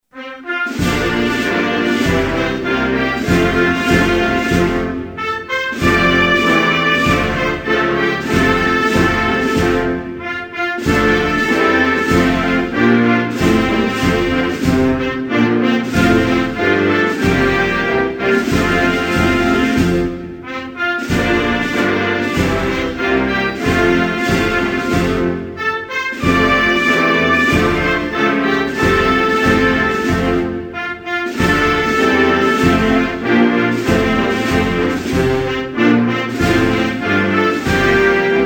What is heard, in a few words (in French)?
Djibouti, Hymnes nationaux